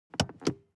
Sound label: transportation sports car int handbrake release medium